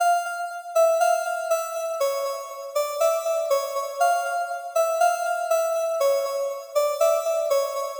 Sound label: Keys Synth 120bpm A